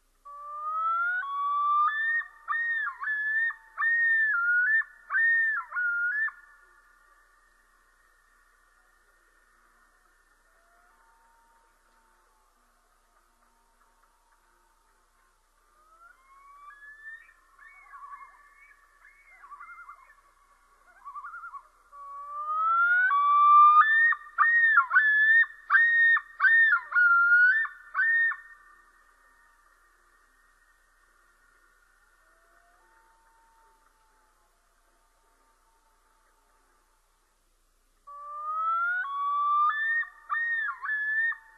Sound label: yodel